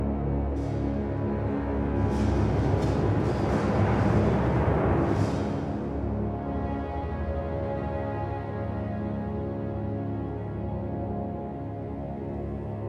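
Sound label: Surprise Attack Loop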